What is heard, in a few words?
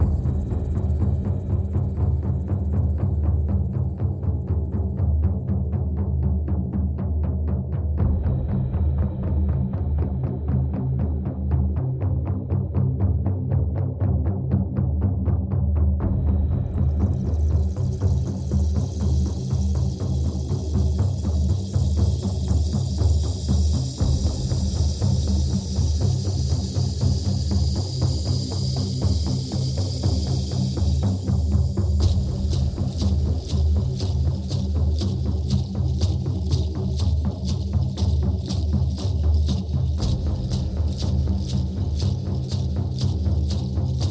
Four Drums Theta Beat